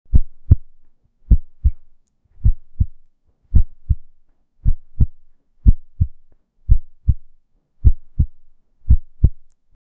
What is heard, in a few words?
female heavy sensual brea